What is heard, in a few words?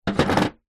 household solid small item set down on metal table